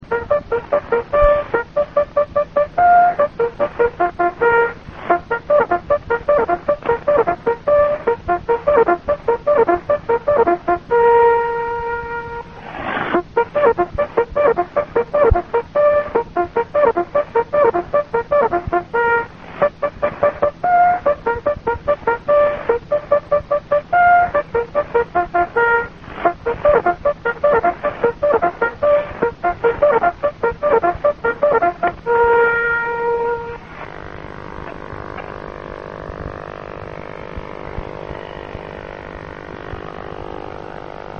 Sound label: Bugle